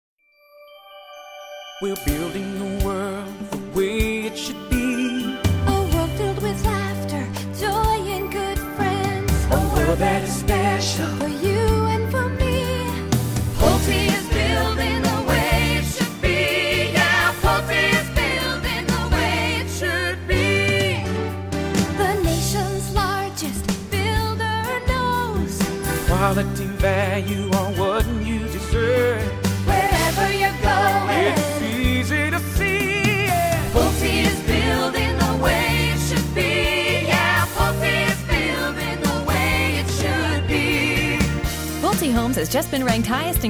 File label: radio Jingle